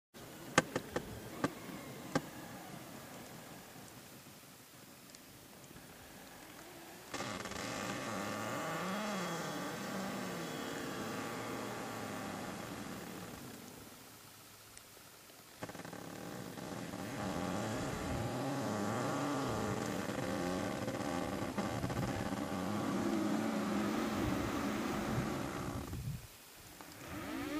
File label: Polar ice, یخ های قطبی